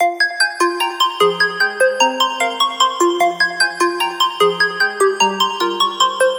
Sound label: Arp F 150, Melodic Loops, Color Bass; Dream; Ghosthack - Color Bass Essentials